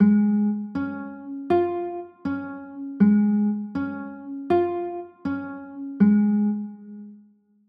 c sharp maj, chords

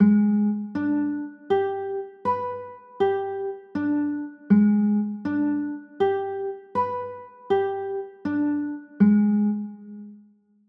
b flat 13 b9, chords

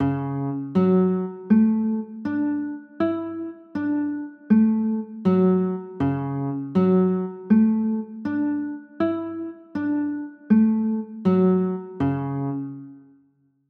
d maj, chords; d maj9

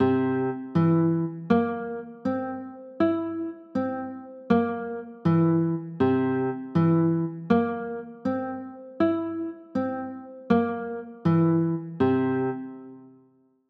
c 6, chords

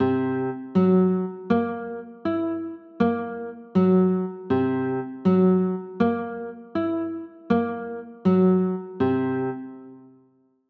c 7b5, chords

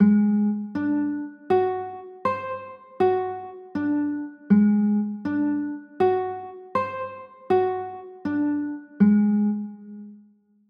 a flat 7b5

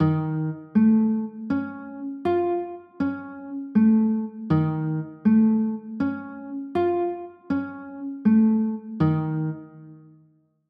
e flat 9b5, chords

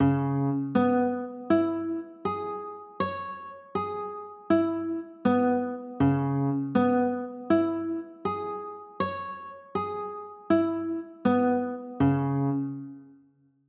c sharp m7

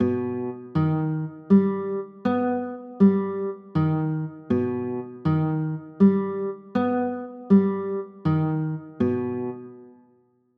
e flat aug, chords

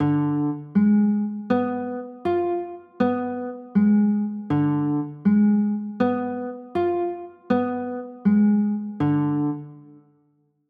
d dim, chords; d dim7

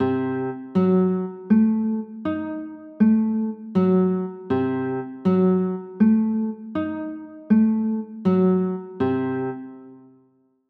c dim7, chords

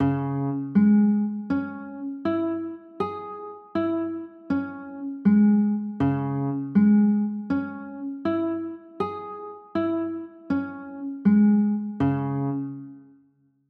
c sharp m, chords

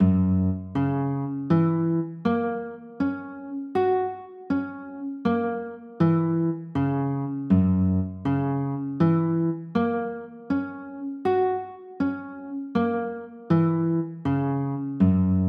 f sharp 9

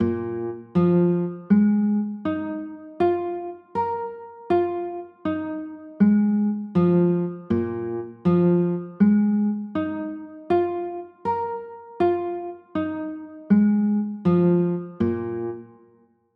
a sharp 7sus4, chords

